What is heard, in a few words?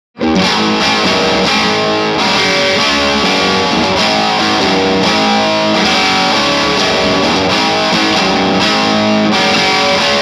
Distortion Medium Gain Clean Amp Vintage Pedal